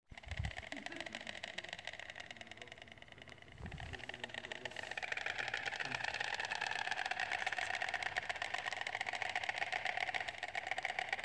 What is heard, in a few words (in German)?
Storchengeklapper; oostenrijk